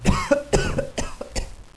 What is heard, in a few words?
cough; hostage